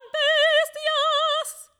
choir

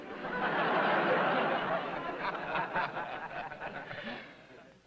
laugh; ozzie harriet

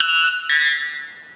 white bellbird

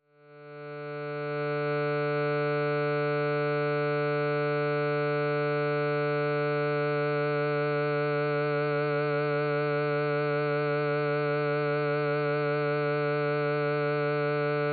even and odd partials

